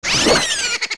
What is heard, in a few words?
Die; Poe Sister; zelda; OOT